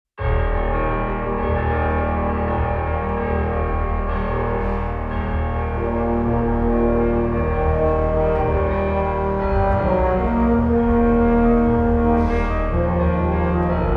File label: TUBA snippet